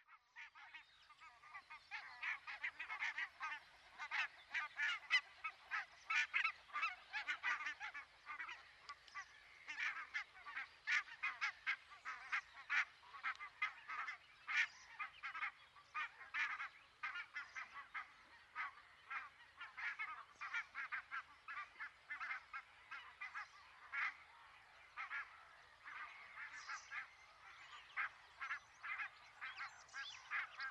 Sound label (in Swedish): ljud vitkindad gas